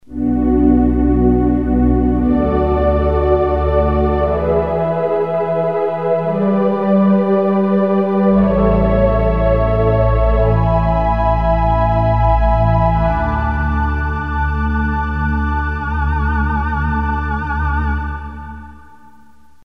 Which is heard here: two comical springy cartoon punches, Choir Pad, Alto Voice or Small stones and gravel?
Choir Pad